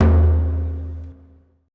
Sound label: ETH DRUM C